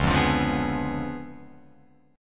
Db 7th Flat 9th, Guitar, Open F; Db7b9 strummed; JGuitar

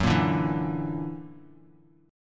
Gb Augmented 7th Gb down, Bass Standard; Gb+7 strummed

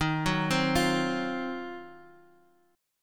Eb Minor 6th Add 9th, Guitar Half Step Down

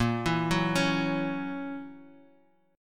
F sharp 7th, guitar

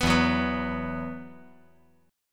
E Suspended 2nd; 4,4,2,2

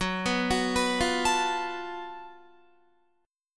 Gb Minor Major 7th Double Flat 5th Gb Guitar; arpeggiated

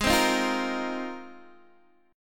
Ab 6th Add 9th Ab, Guitar, DADGAD

